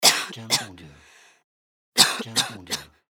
Cough Female

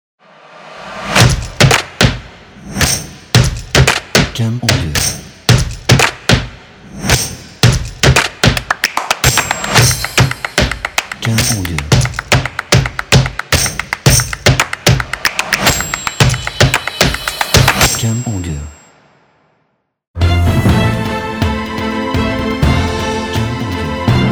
Action Intro Logo; Action Intro Logo Pack

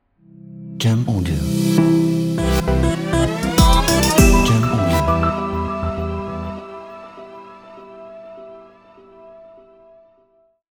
Ambient Logo

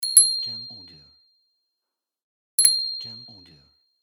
Bicycle Bell

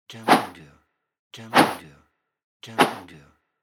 Whooshes Transition

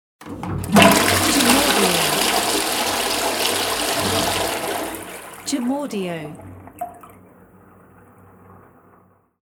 Flushing the Toilet

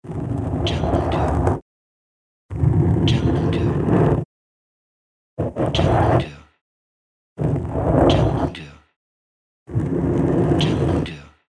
Dragging Stone

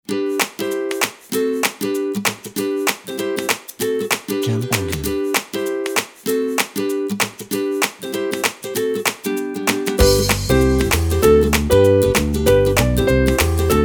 Upbeat Positive

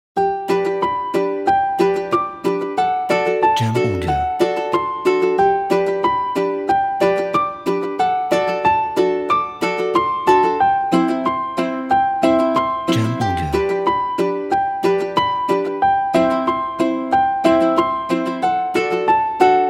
Kids Ukulele Piano Intro

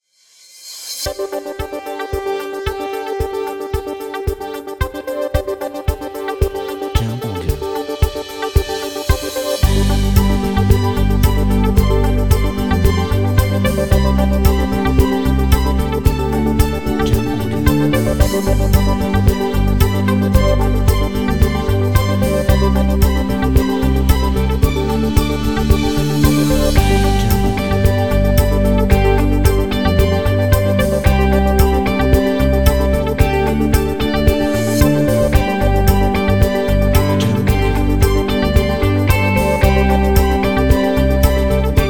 Corporate Business

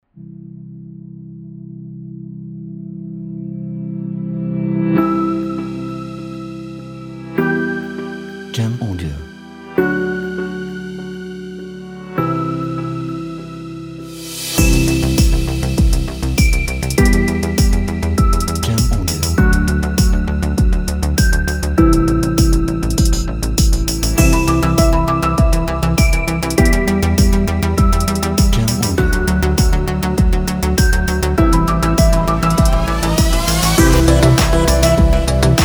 Ambient Future Tech

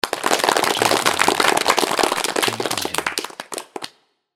Applause Small Audience

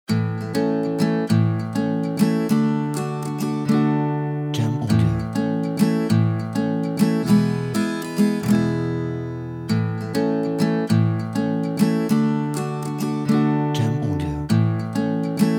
Upbeat Guitar Background

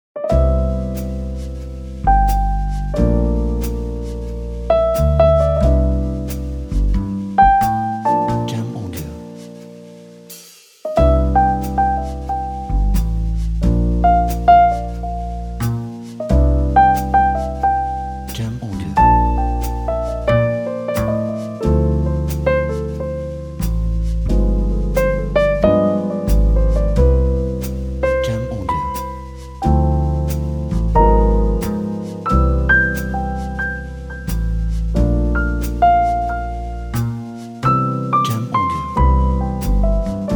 Jazz Bar Slow Background

